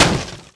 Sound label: hit wood